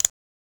one single satisfying cli, assemble

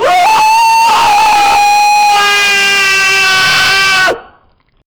a doll that scream

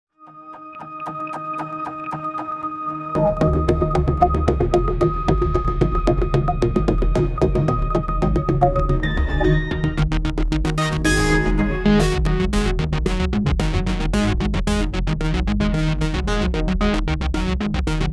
futuristic abstract melod, abstract

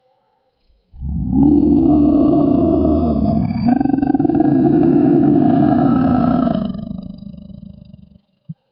a loud aggressive crocodi; beast

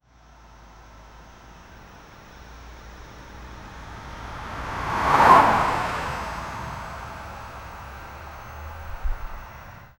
motioncamera slowly pans

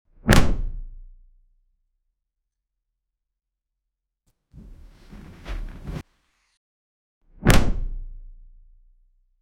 a loud and impactful sfx, book; sound effect

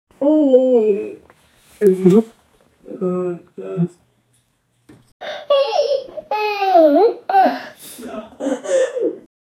a lazy student waking up; bed

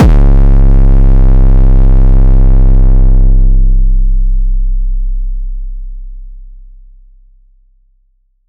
808 kick that sounds like; percussion

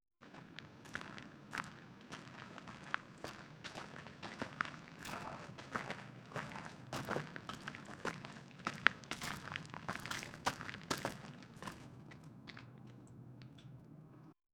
boots on wet ground

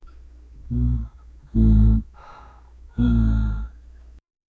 first breath like my body, breathing

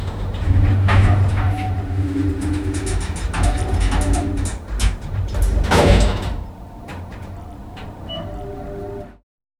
elevator

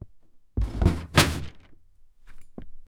cabinet slam

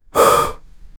man inhaling and exhaling, breathing

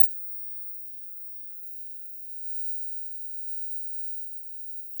sudden high frequency sting

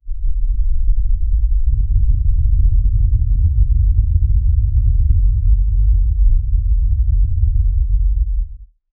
a haunting ambient score; sound effect

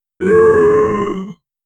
final miserable collapse, death

